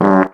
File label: bump; fartblaster